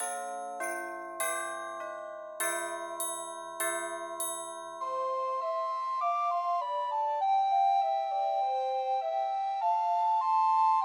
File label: Ding Dong Merrily on High, Christmas Trios for Two Descant and One Treble Recorder